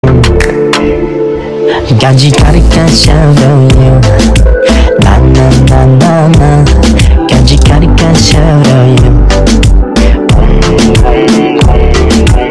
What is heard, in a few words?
bass boosted